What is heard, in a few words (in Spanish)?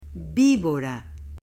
VIBORA; ANIMALES